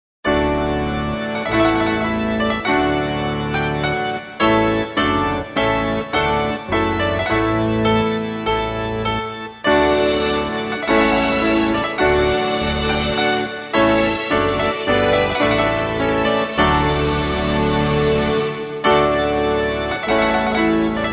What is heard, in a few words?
trumpet